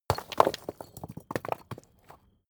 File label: stone large impact split